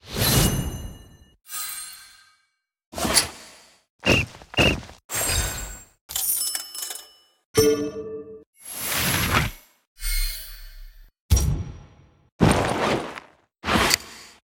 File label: Ui Menu; Archeland